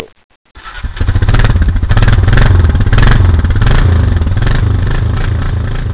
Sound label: bike